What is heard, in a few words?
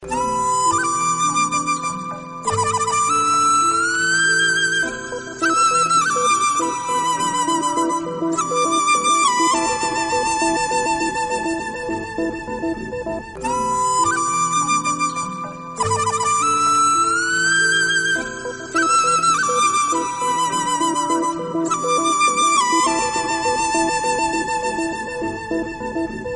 flute